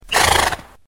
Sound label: horse nicker; animals